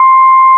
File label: flute C4; CMP - Analogon; FLORGAN